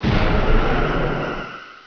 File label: Die